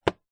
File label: lawnmower electric cordless plastic battery cover close; household; zapsplat